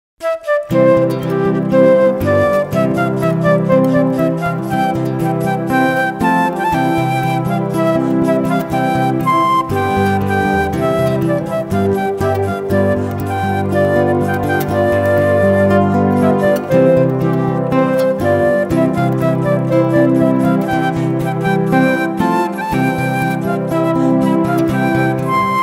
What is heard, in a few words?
Fanfare w Flute